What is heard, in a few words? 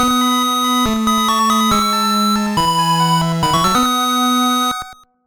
Medical Machines; 医療マシンにて